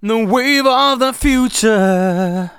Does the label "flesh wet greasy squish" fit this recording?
No